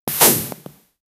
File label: boing